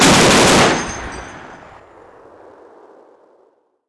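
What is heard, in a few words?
infantry fighting vehicle burst; ifv burst; SuperPower 2 Redux V7A; unit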